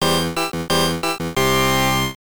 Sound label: Lose (Hit Mammoth); Fuusen Pentai; arcade